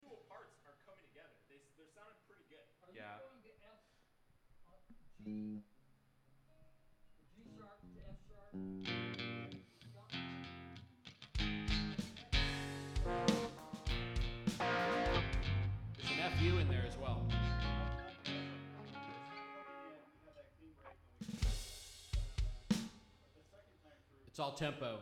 vocals

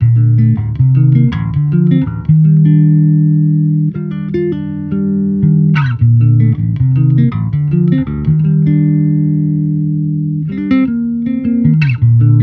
Amped loop 80 Bb, Electric Bass Loops; Slate Digital BASSHOLE Sample Pack

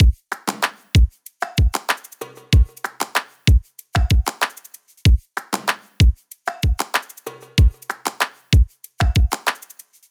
drum loop 95; Pop; Drums; Full Drum Loops; brightside; Slate; SD PASADENA Pop Sample Pack

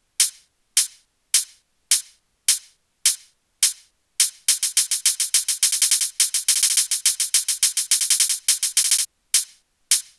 hat 105, Drum Loops, Hat Loops; SD MIDNIGHT Sample Pack; Slate

